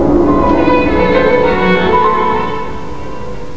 strings; Strings being played as door is opened; Titanic

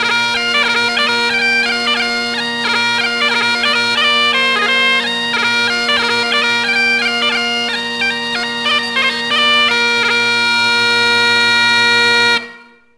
bagpipes

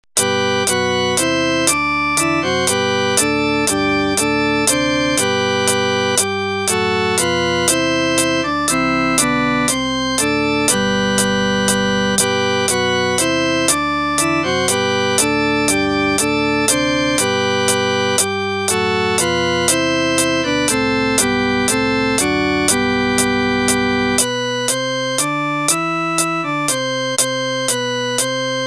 bass

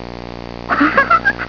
laugh

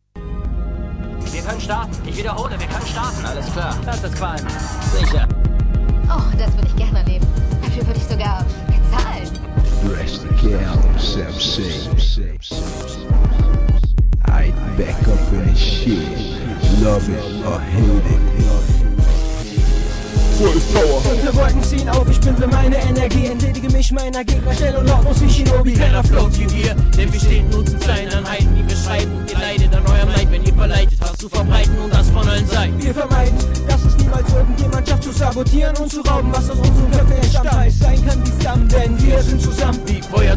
Intro Full Power

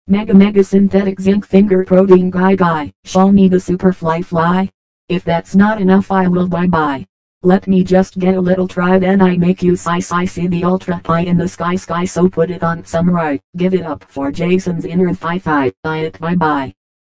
Synthetic

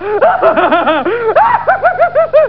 laughing

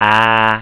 ahh

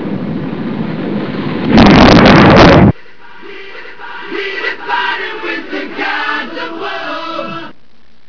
rocket

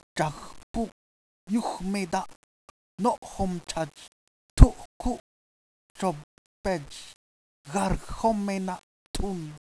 ghargh

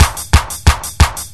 180 clap

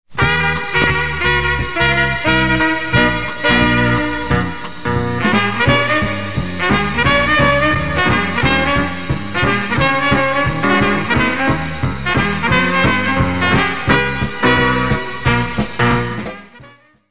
bandstand

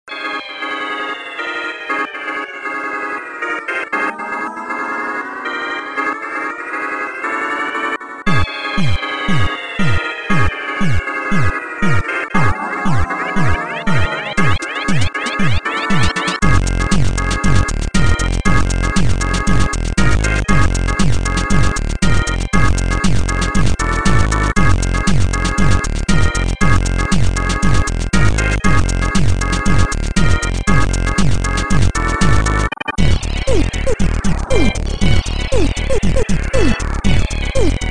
Battle

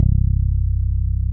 bass e

